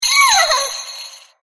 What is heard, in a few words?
Mistletoe appear